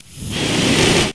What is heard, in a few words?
marine fire; spacehulk